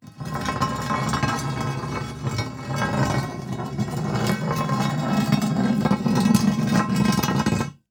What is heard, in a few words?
Heavy Metal Pipe Scraping on Concrete; SoundBits; Drag & Slide